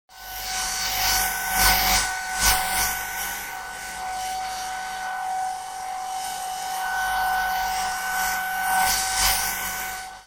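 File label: hair dryer noise, volumetric 3D sound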